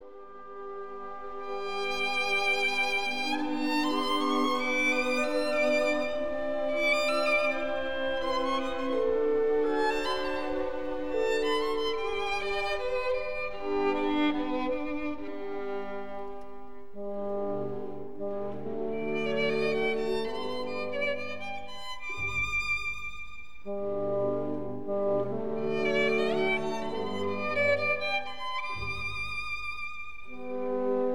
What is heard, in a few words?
Beethoven Violin; Wiener; Kondrashin; beethoven violin mvt 2